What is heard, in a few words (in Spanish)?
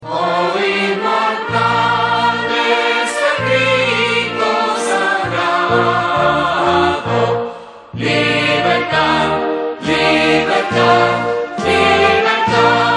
himno nacional argentino